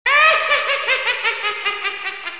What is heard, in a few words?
witch laughing; cstrike; sank sounds